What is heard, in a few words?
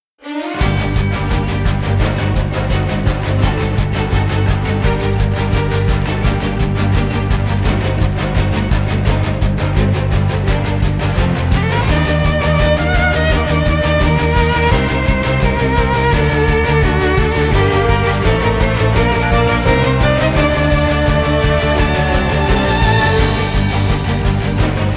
battle